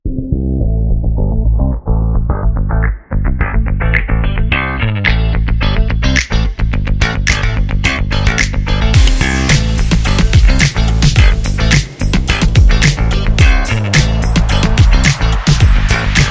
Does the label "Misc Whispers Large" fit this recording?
No